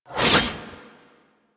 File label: Warfan Whoosh; Warframe; Tenno; Broken Frame